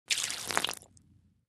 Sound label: Spill Liquid Carpet, splashes